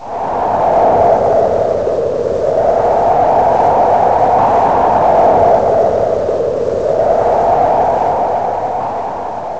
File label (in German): Sturm